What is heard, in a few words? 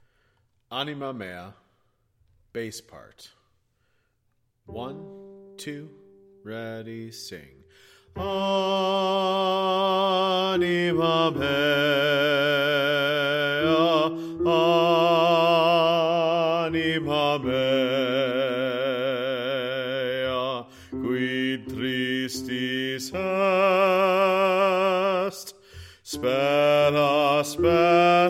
Bass